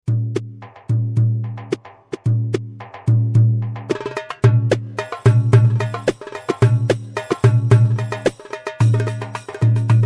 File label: percussion show; korg pa55 tr orjinal